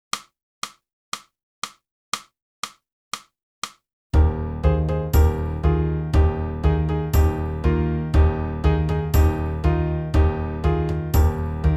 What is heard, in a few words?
sax